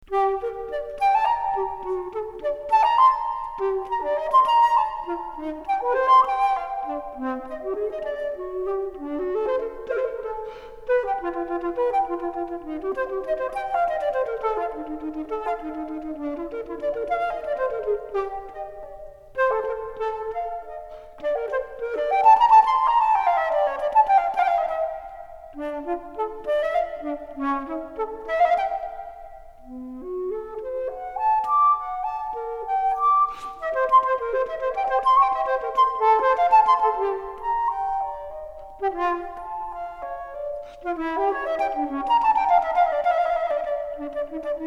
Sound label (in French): sonate flute; cpe bach; kuijken